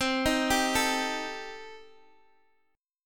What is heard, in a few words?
C Minor 6th Guitar Open G, chord